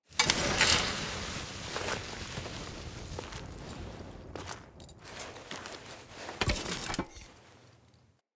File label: Blacksmith Poke Idle To Table Idle; Blacksmithing; Friendly; Tuvalkane